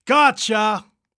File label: water spit; taunt ocean; Ocean Obyn (Battles 2)